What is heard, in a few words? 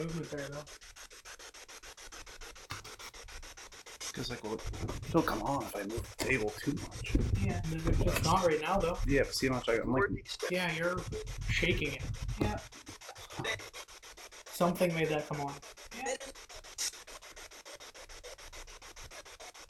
Indian Head Room Spirit Box; wexford general store antiques investigation